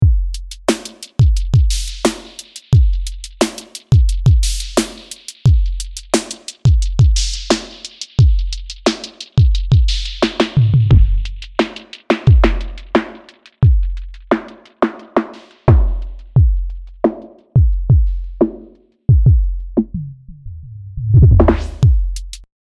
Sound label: Audiothingies DoubleDrummer KoolKit Reverb Delay Filtersweep